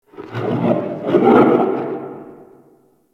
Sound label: Infested Distant Creature Snarl; Warframe; Corpus Infested; Ambience